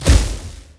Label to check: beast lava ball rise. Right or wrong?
Right